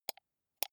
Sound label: computer mouse wheel click slow soft